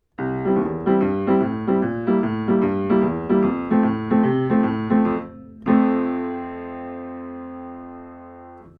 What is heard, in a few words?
ORTF Piano; Oktava MK012